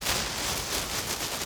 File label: glitterbomb fuse